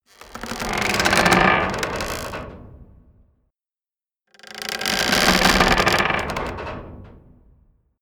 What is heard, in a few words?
wood grind large